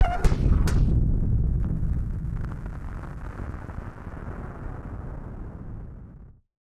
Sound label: Firework FX; musicradar; bomb factory